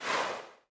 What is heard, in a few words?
Scroll; Idle Wizard